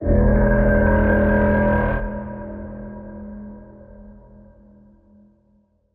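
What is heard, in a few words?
Alarm Deep Siren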